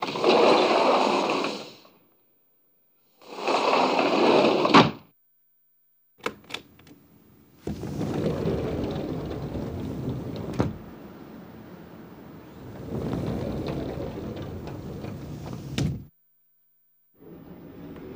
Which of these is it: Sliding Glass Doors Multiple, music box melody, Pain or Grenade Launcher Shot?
Sliding Glass Doors Multiple